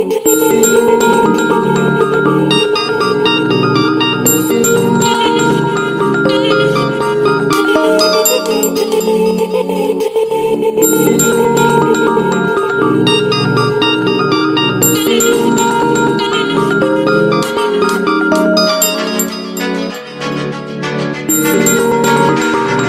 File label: oppo ringtone